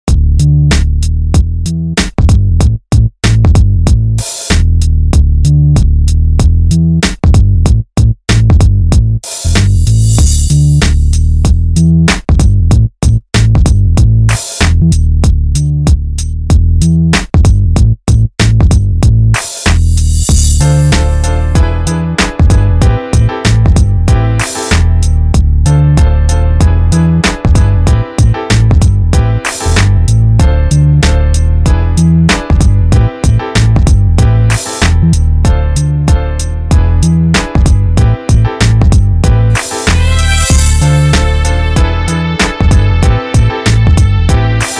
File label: PHAT Loop